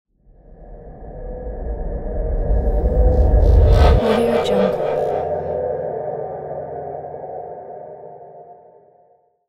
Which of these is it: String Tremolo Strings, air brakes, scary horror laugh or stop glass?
scary horror laugh